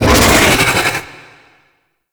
Attack Vocals; Gameplay; Golden Maw; Warframe; Ambience; The War Within

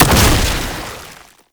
Wall Climb Death Explosion; Warframe; Oraxia

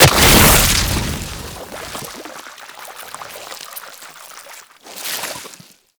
Inked Enemy Death; Warframe; Tenno; Ink Rifle

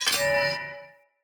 Curved Sword Whoosh Accent Heavy Start; Weapons; Player; Swords; Sinecure

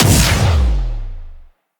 Melee Heavy Air Slam Impact; Whooshes; Jump Slam

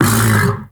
Vocal Tranq Grunt; Warframe; Hunting Critters; Undazoa

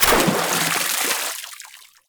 Wall Climb Dodge End Water; Warframe; Oraxia

